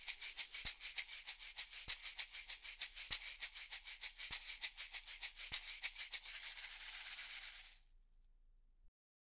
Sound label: shaker justin jm440